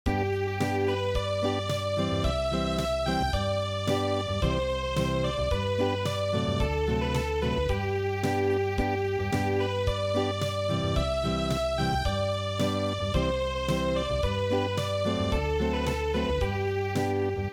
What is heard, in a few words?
Acoustic, traditional